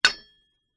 metal mining pick axe fantasy material action movement texture; ancient game